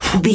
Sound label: voice male breath quick